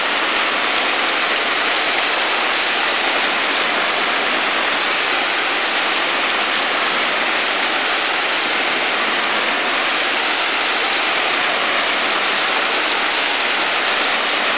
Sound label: waterfall; grimthane